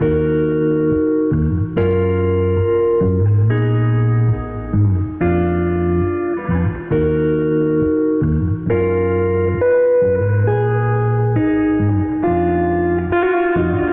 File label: guitar, Loops, Sad